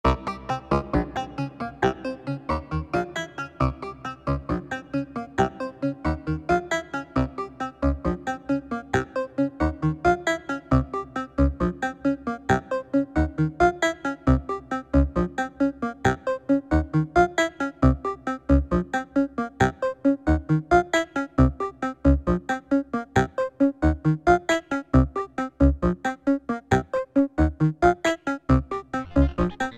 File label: Band Mod Synth